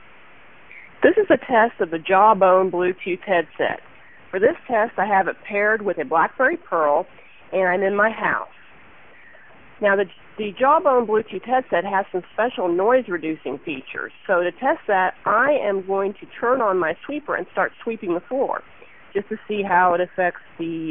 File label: inside; jawbone